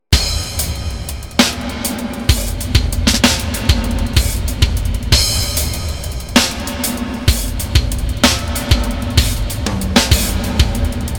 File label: verb test gothic Church